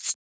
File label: Shaker